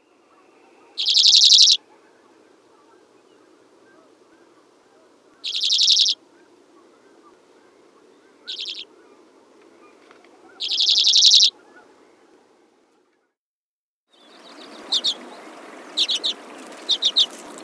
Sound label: Hoary Redpoll